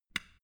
switch small turn on soft

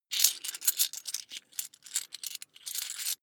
coins big search

